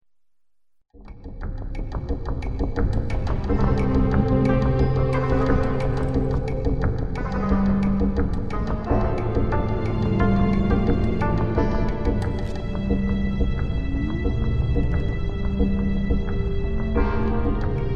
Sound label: spiced drum; Heavily percussive cover track for a commercial, drawing from a variety of eastern instrumentation.